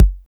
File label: Sharp 808 K; Roland MV8800; TR-808MKS